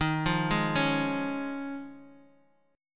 D sharp 7th Suspended 2nd Suspended 4th, Bass Standard; D#7sus2sus4 arpeggiated; JGuitar